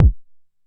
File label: m base, Jomox Mbase01